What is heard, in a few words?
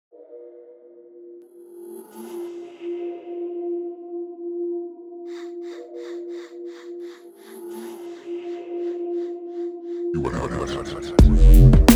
boombap; weeklybeats-2018